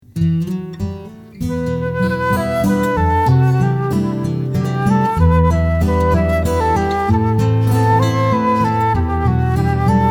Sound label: Waltz